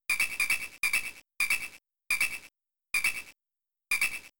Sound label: Sharpen